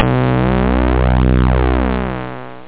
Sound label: String; LittleImps; imps maths